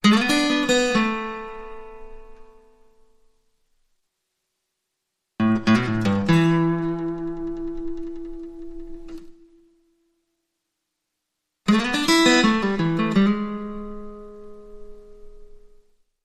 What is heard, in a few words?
Blues Licks